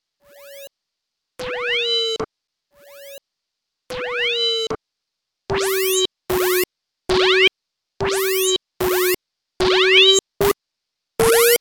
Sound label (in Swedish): fjallgoling; Swedish birds; Sädesspov och andra fåglar